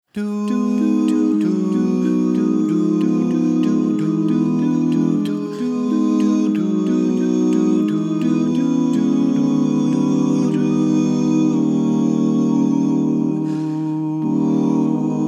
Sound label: bass solo